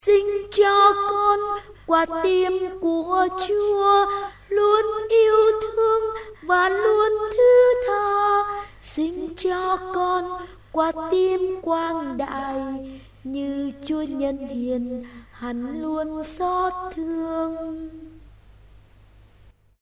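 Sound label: soprano alto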